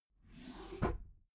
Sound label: desk drawer open regular